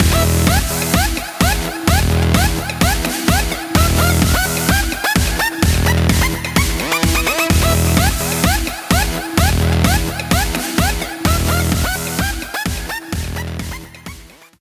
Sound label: Stinger; Champions Field; rocket league